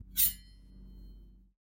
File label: sword swipe; soundscrate